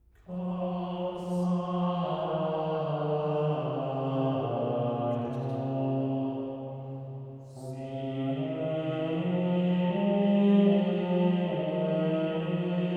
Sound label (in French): Messe pour orgue, Gloria; L cole de Nivers, David Ponsford; VII. Gratias agimus tibi